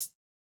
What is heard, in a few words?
Jrock hihat